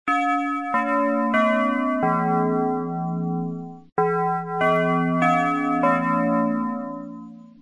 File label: westminster deep